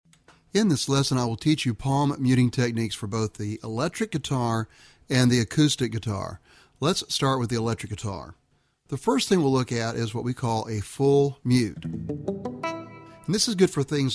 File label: Palm Muting